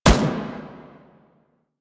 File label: Turret First Shot; Weapons; APC; Warframe; Lasrian